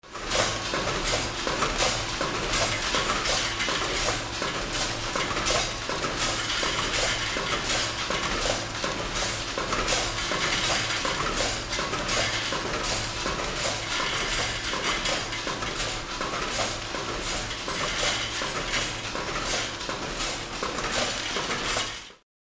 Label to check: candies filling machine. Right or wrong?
Right